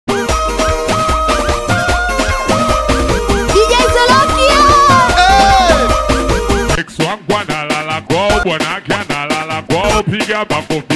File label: BEAT